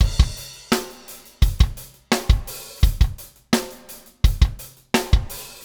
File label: Metal Groove Beat, Rock Drums